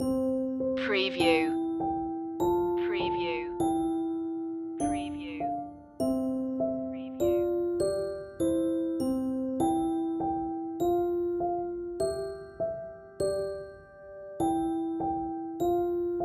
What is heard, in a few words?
instr loop; twinkle twinkle little star